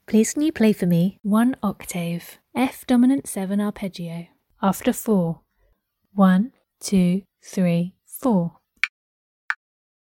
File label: f dom7 arp